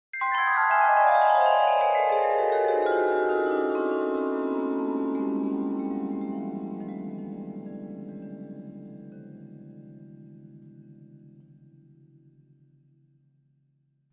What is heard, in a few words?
Vibraphone Magical D; mallets vibraphone